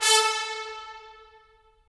BRS TPS, Stacc; Roland - LA Composer vol. 4

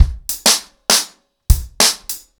100BPM, ACOUSTIC DRUMS

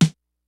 FUNK, DRUMS; Kid Nepro; Elite

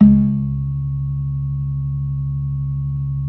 B3 C#2; Best Service Hyper Dance

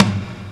REV-TOM; Rubber Chicken Software Sample Bank; DRUMS + PERC

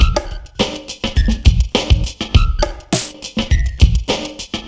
Busy Mess, Drums Beats

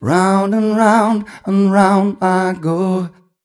m 128 mel b-flat, Vocals

